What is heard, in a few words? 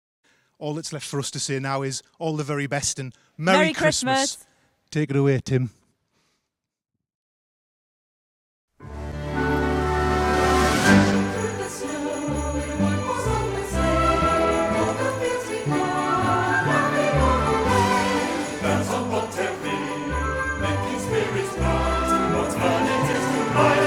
jingle bells; sage gateshead